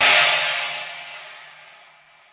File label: CHINESE TD, Roland TD 7; DRUM MACHINES